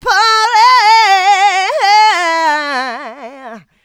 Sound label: voc, female vox, Vocal House